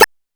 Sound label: Perc, DRUMS; ChipShop Deluxe; ChipShop Hits